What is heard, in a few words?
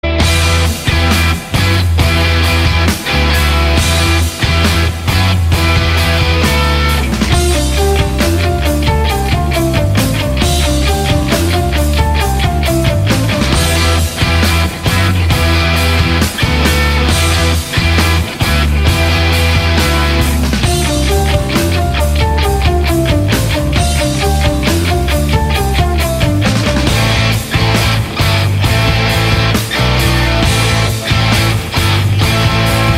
anime music theme, ringtone